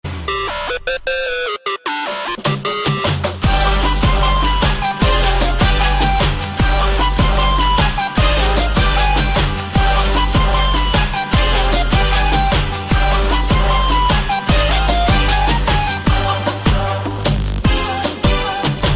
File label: Greek oriental